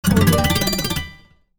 tone menu page

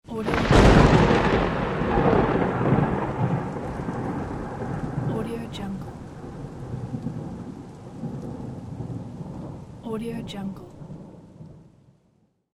lightning

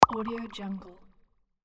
bubble echo popup

